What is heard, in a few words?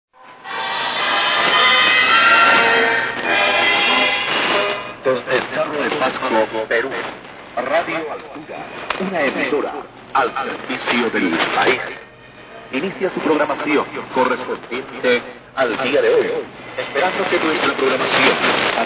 Radio; Radio Altura